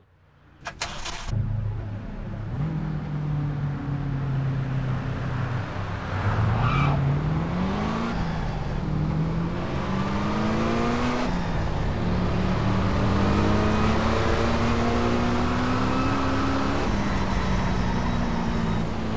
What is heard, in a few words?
koenigsegg cc8s; TDU-1